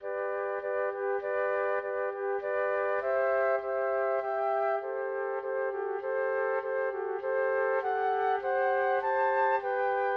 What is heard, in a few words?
flute trio; bach